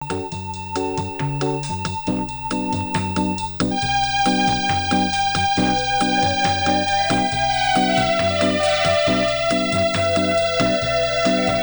concerto

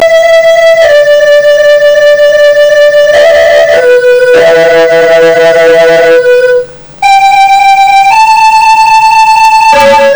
concerto

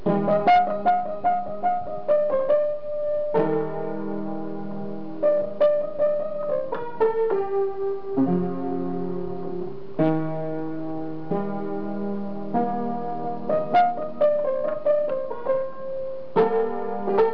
piano

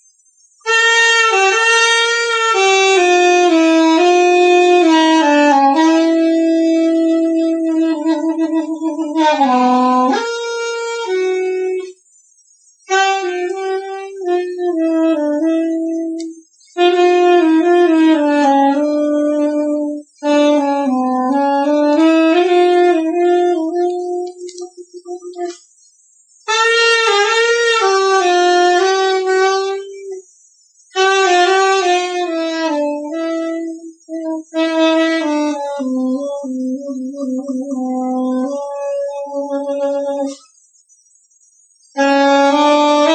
sax

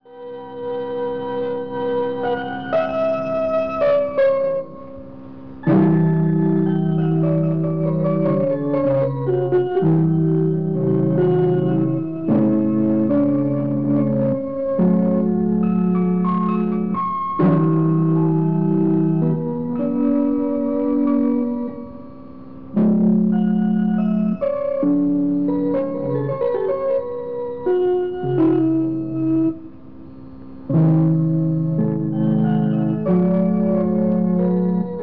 piano

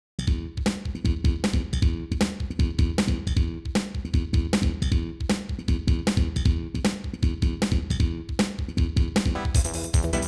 concerto

